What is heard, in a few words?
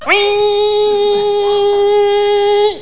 vocal alarm